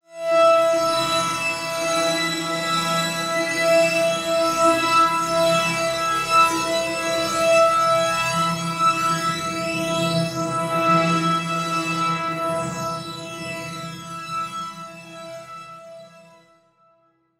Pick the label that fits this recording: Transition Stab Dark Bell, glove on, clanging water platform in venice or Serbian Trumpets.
Transition Stab Dark Bell